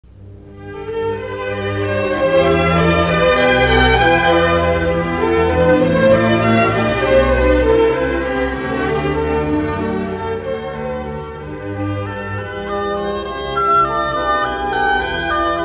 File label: Baroque music